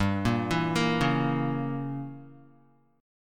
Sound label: G Diminished 7th chord, down, Guitar; arpeggiated; JGuitar